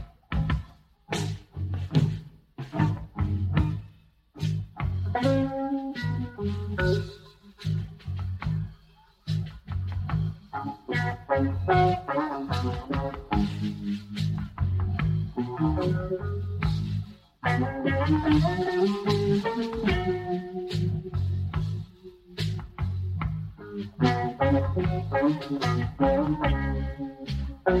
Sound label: Instrumental; The Late Show